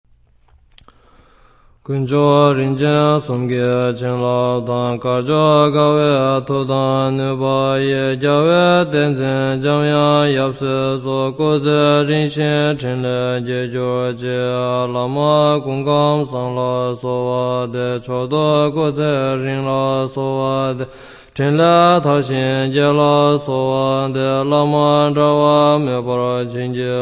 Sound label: Prayer for concluding Long Life Prayer